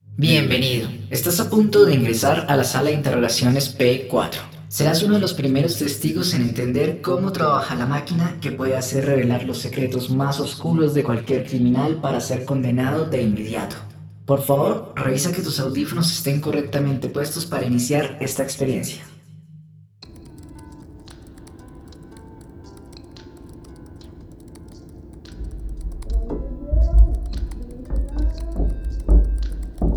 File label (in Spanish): PAISAJE SONORO BINAURAL